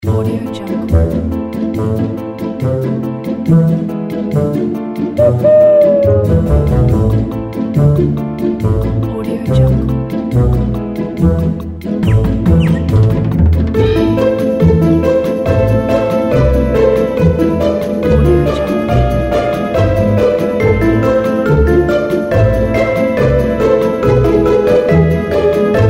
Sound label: cute kids holiday